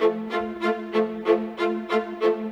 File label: Viola, Orchestral; Sonic Foundry (Sony Creative Software); Orchestral VOL-2; Modern 29